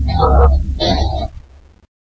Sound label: sawrunner pain